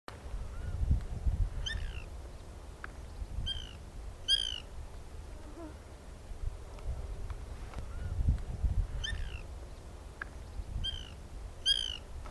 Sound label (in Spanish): Carpintero Blanco (Melanerpes candidus)